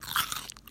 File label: crunch